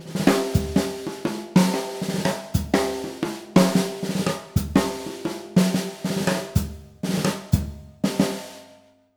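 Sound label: breakbeat, 120; Univers Sons; Jazzistic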